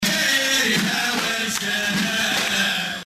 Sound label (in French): rythme musical